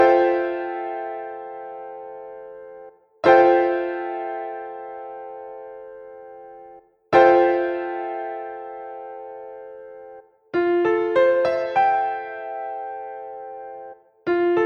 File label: Fmaj9 Piano Chord